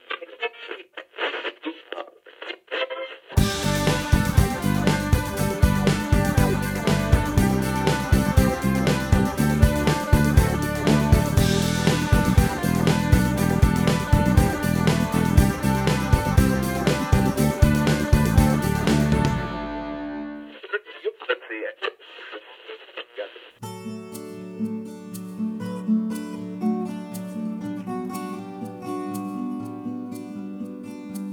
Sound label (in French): Bataille de musique